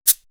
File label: Perc; Coldcut's Kleptomania VOL1